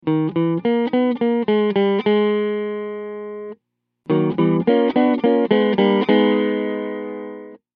Pitch Transposer Minor Triad

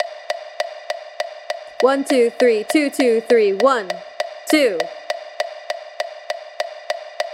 FAST; 6/8

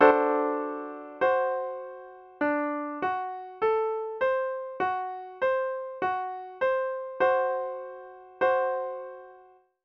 Secondary dominant tritone

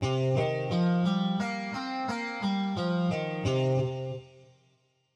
Rock improv C minor pentatonic

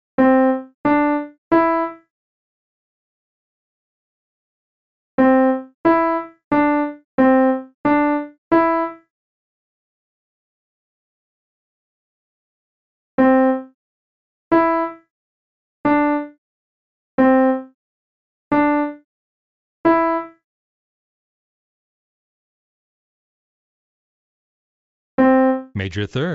Melody Intervals

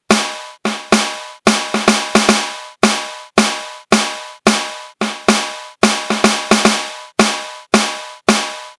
Snare Drum hollow multiband compression

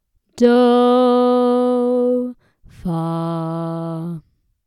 Tonic subdominant

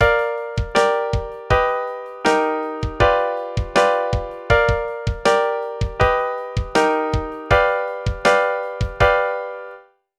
Chord

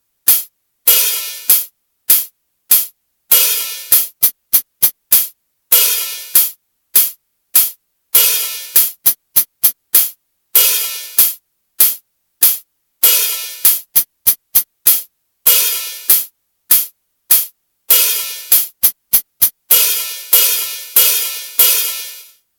Hi-Hat 16k boost

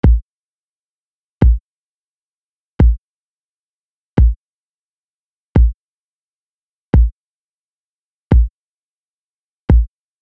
KICK

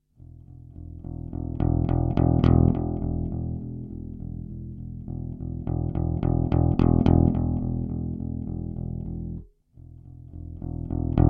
Uneven Dynamics Compressed; bass

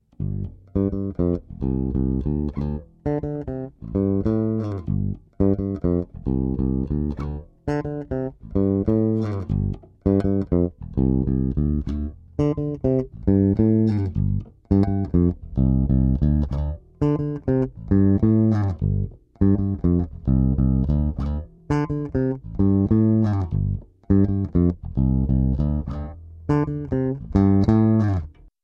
Electric Bass Blend; Bass Tone

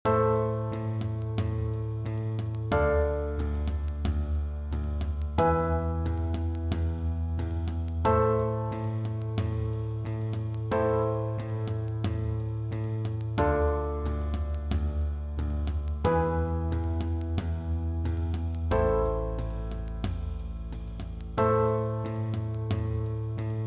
Minor Chord Progressions ii v vi Progression

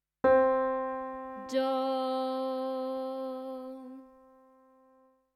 sung tonic; do

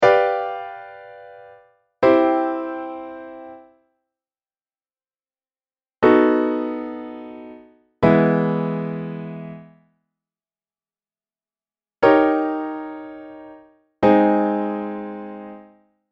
Cadence Examples Authentic

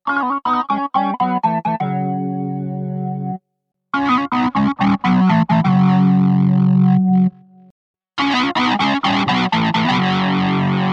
Organ

